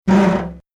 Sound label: Metal Stress Pulling Scraping Metal Single; Metal creaking, giving in to pressure, grinding; Machinery